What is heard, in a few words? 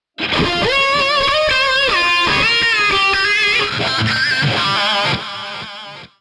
Clubs Music